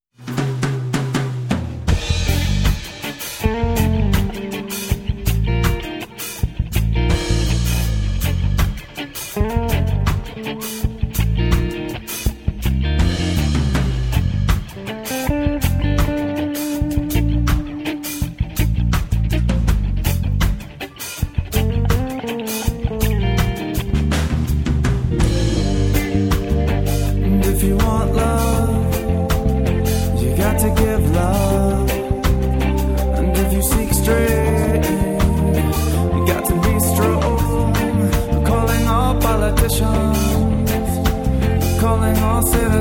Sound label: drum school music; afterbeat